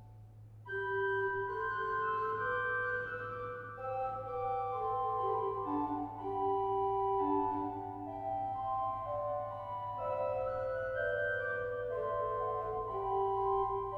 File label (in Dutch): gavotte, orgel; Beilen; Drenthe; Machelbeck